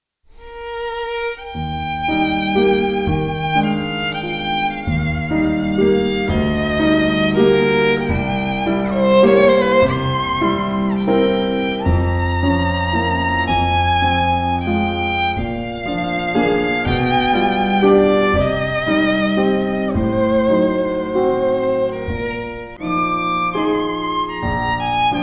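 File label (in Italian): Duo Notturno in mi bemolle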